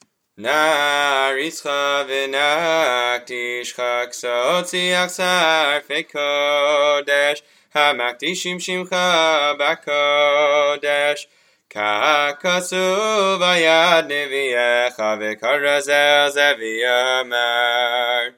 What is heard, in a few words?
Naaritzcha Upbeat Melody; Musaf; Yom Zeh Mchubad